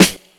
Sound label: clear snare drum sample f sharp key; tuned snare samples